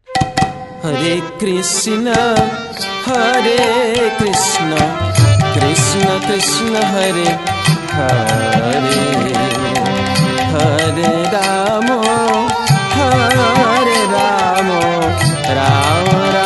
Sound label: Bengali Tune